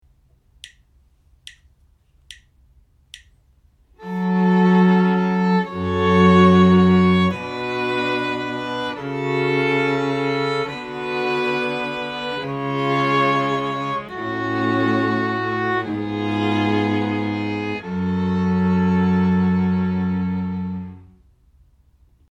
harmonic dictation

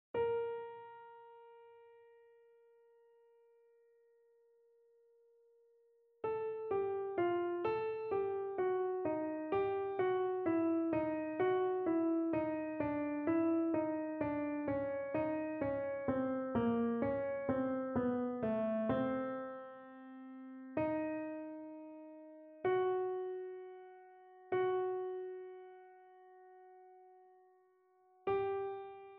melodic error detection; ear training

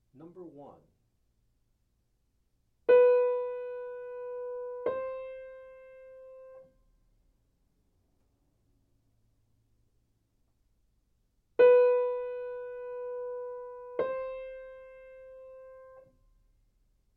intervals; eartraining

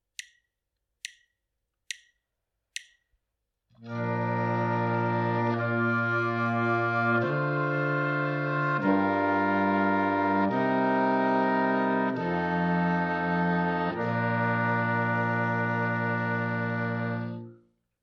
harmonic dictation

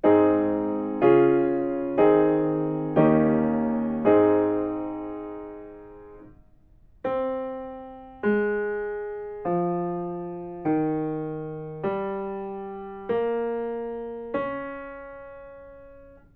melodic fragments

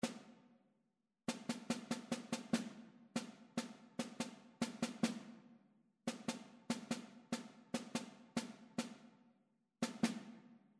rhythmic error detection

